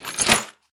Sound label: metal Crate Close